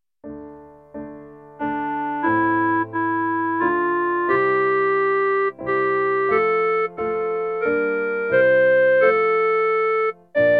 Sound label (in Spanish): CLARINETE; Mas vale trocar